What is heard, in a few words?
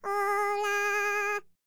BULGARIAN, World Voices, BALKAN